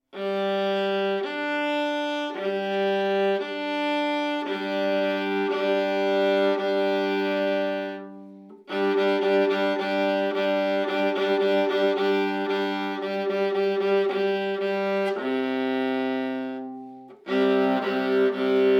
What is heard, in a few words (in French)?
Improvisation avec doubles cordes Alto